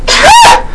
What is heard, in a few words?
taa